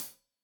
Hat Tight, Deeply Sampled Drums Cymbals Stereo Hi-Hat